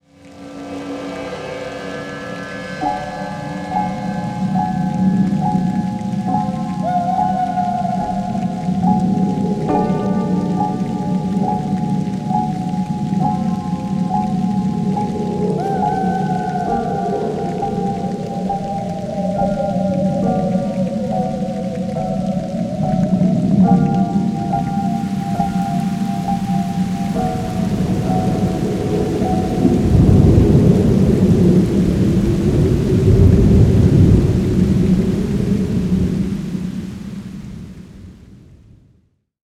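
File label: castle courtyard, synclavier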